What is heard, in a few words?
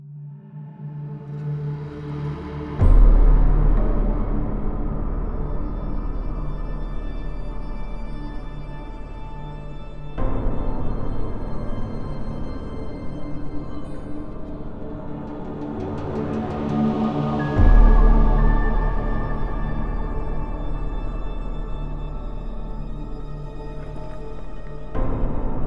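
bonus ambience; netent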